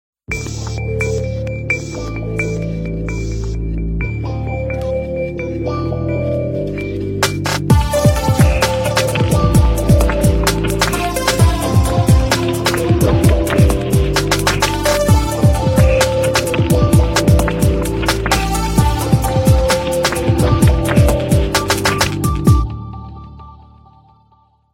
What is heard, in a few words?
nokia ringtone